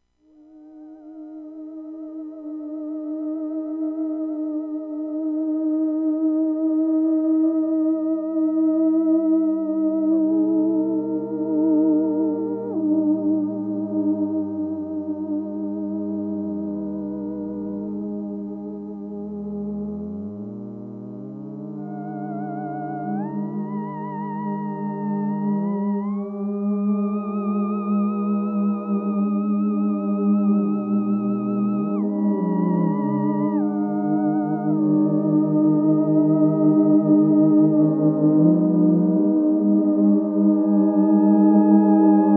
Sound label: Midsummer Theremin Waves, Etherwave; DreadVox